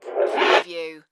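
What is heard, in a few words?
Radio Static Scratch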